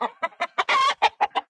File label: Rooster Panic